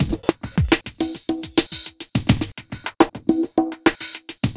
philter beat hats n perc; Sonic Foundry (Sony Creative Software); Ugly Remnants VOL-2

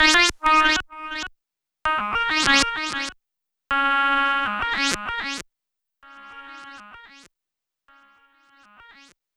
SYNT, Hip-Hop & R&B; USB Soundscan vol.47; AKAI

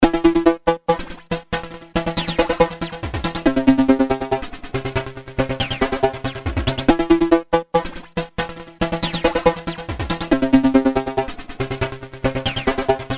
Waspy, synths; Industrial

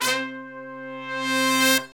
brass longs, Brass Sections, Horns; Roland LCDP06; R&R Horns; R&R Sect.sfz

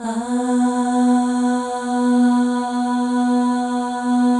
AHS, Symphony of Voices, FEM; Spectrasonics; FEM.2 AHS

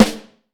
CHB, Vintage Snare Drum; Q Up Arts; Johnny.C's Vintage Snare Drum; 5.5X14 SLR

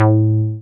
303, TB-303 BASS

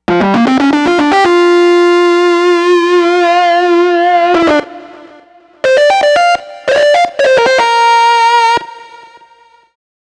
Grunge Lead; Dr. Fink's Funk Factory

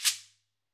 Ahfuche; Club-50 - Foundations Roland; Ahfuches

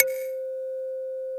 ethnic C5; ETHNICAL

